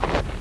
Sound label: snow